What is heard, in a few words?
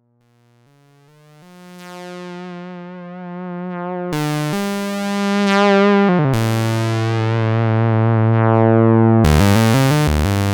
dual SAW RING mod